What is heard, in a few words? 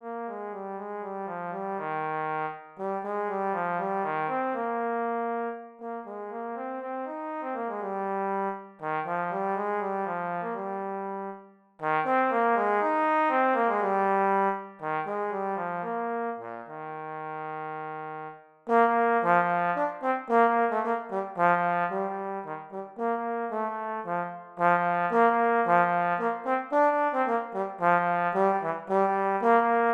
trombone